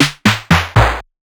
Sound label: snare falling; Hip-Hop; Drums; Drum One Shots; Slate; CAICOS Hip-Hop Sample Pack